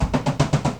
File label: Toms